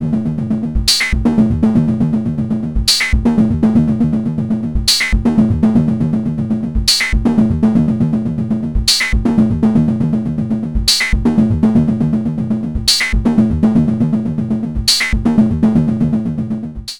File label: Dreadbox Drips V2